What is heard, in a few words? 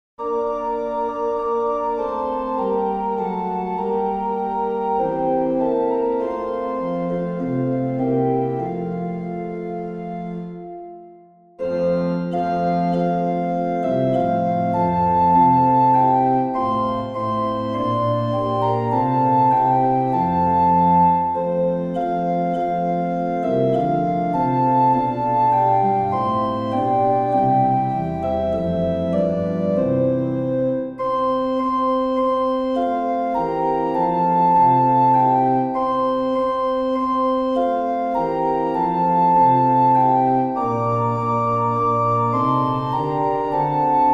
Organ and Trumpet Descant